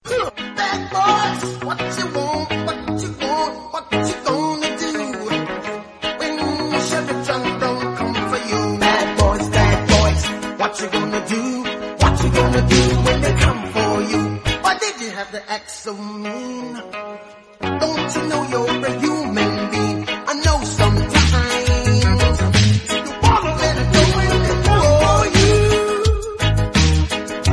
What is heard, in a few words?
Hollywood Ringtone